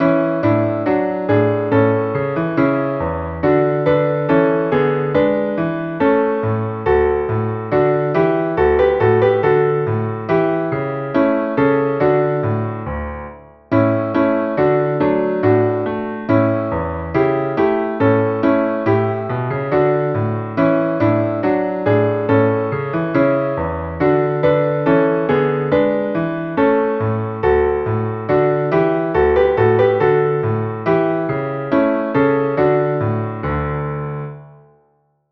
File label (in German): Klavier